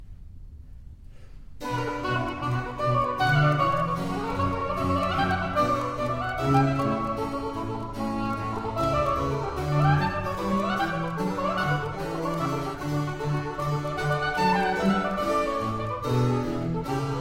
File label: Giga presto Sonata for Oboe and Basso Continuo in C minor; Platti